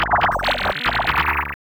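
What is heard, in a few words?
synth electro 140 A; Techno; Keys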